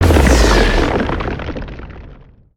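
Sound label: Earth Tornado Mid Blast; Spinjitsu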